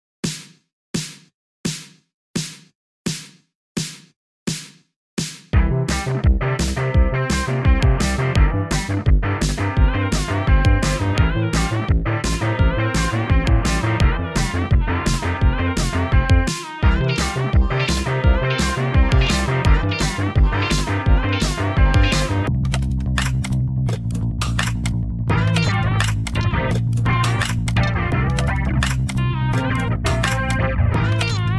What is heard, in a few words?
guitar